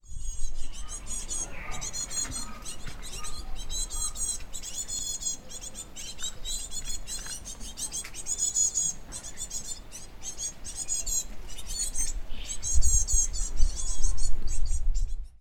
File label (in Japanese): シジュウカラ 幼鳥; 練馬区大泉